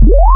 Harmonics Sines; Ableton Live 12 Lite; Vector; Sprites

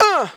Vocal "Uh"; One Shots; Ableton Live 12 Lite; Core Library

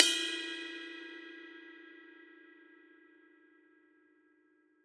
Ride Cup Gen Purpose; Drums

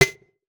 E-Perc Tape Measure, Electronic Percussion; Drums; Ableton Live 12 Lite; Core Library

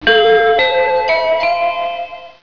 bells; Digital Paintball 2

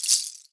Shaker Seed Pod Bangle; Drums

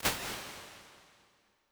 Hybrid Halls Rich Verb, Impulse Responses; Ableton Live 12 Lite

